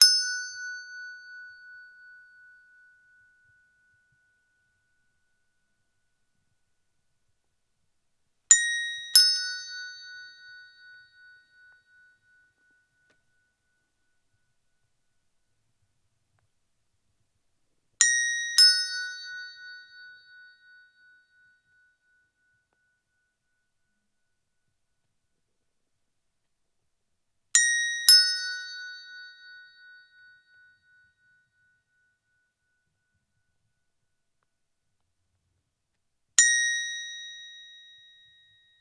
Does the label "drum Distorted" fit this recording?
No